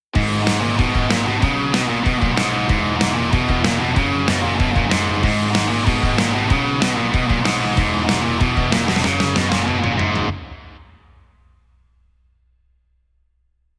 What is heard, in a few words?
drum off